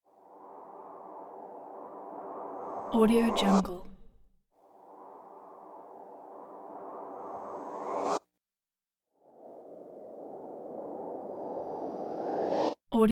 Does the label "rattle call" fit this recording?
No